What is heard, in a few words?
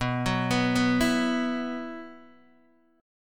B Suspended 4th, Guitar, DADGAD